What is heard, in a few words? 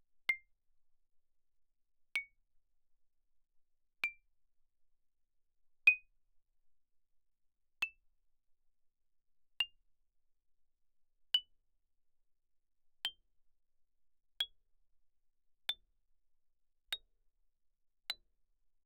xylophone hard rubber ff C7 B7; Percussion